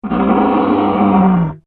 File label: Dinosaur Groan, Creature; Animal; Dinosaurs; Animals; BlastWave